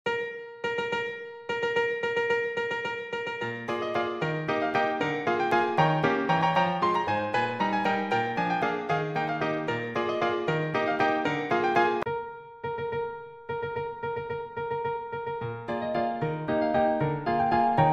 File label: AkoustiK KeyZ Grand Concert P Concert F; DSK